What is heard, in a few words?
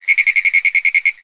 frog long; ambient, random animals, frog groups; Left 4 Dead 2